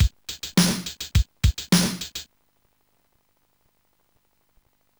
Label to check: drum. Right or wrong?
Right